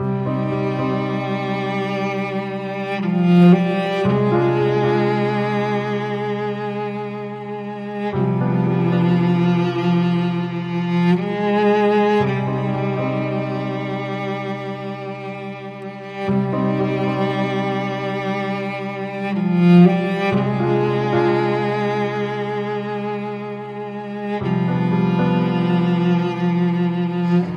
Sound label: No Percussion; 30sec